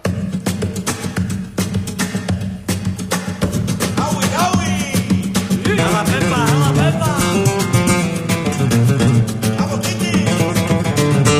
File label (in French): Chanson gitane